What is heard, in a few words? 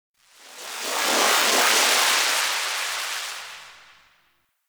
Power Rift Buff; Gameplay; Warframe; Zariman